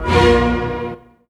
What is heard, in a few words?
symphony; Q Up Arts; Dream Experience; FILM TOOLS